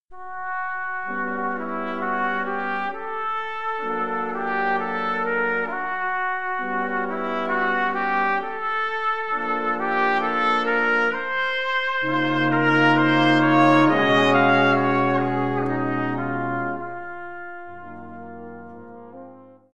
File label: Brass Menagerie